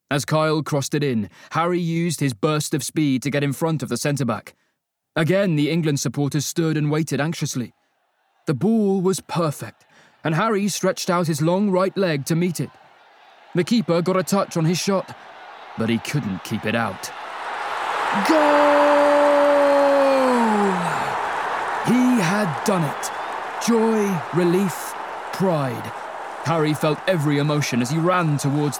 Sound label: Goal Cheer Stadium; Kane